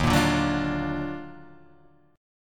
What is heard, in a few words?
D sharp Minor Major 9th D sharp down